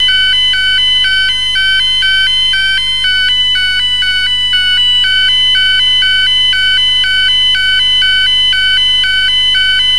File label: full alarm